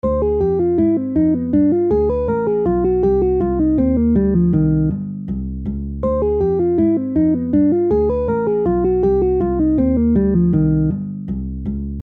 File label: minor 2 5 1